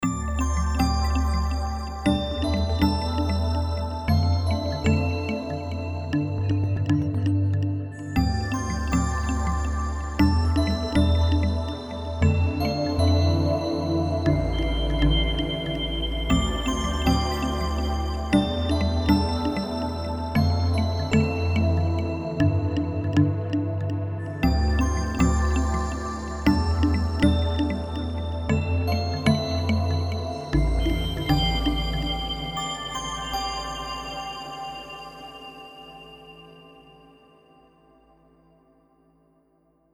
atmospheres

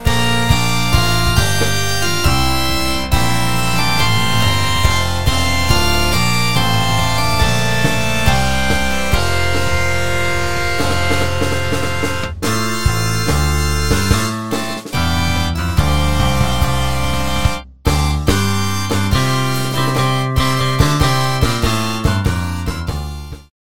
rocker organ

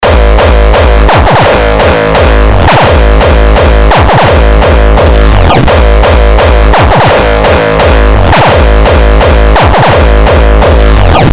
hardcore kicks; hardcore kicks vsti

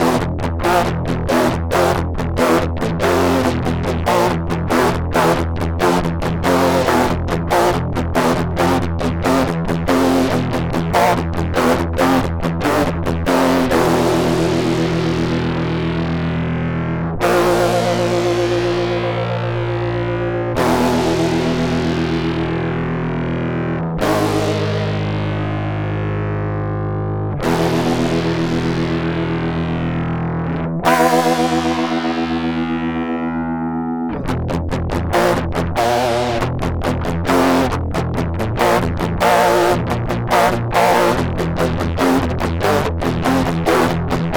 metal

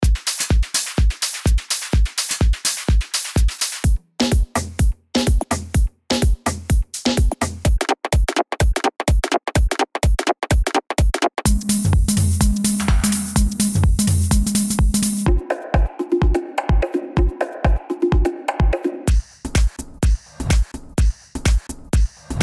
drums

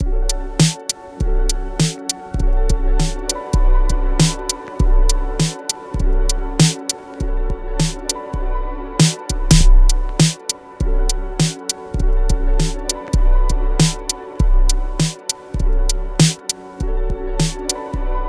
electro urban drums

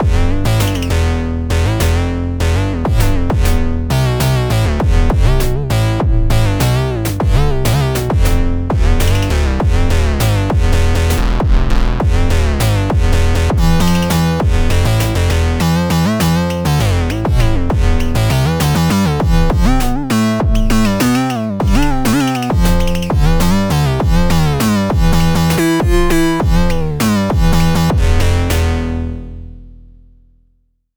organic bounce

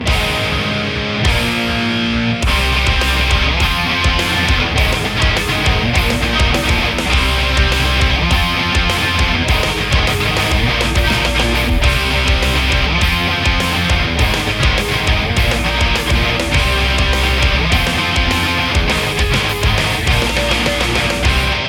post grunge

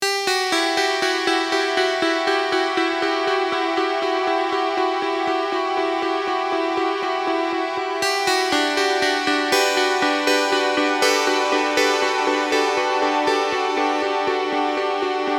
strings; junes

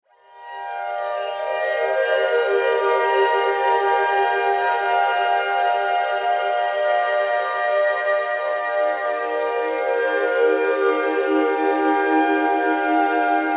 forest glade

